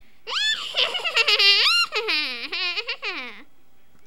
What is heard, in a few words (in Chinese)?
可笑